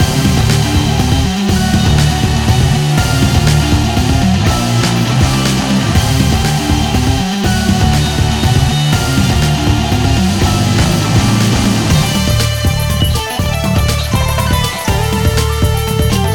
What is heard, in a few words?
bgm moonboss loop